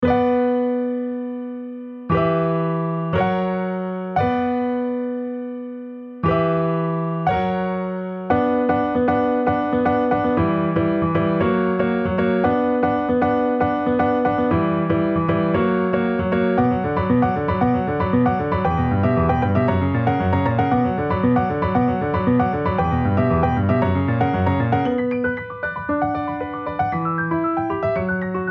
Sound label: piano only